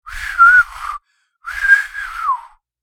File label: Man trying to whistle